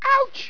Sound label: scream